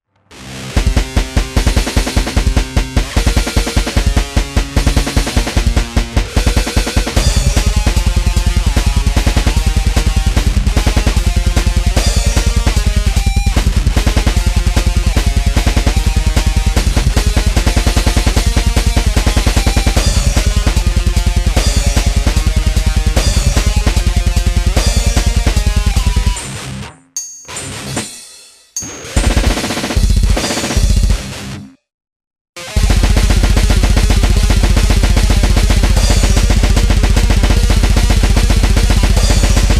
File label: drum n rhythym